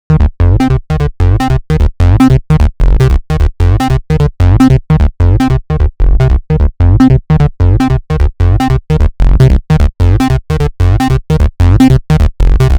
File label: New Beater Trance; acid techno trance vol2